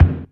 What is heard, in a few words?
Kick, Drums Percussion; Zero-G; Total Drum Bass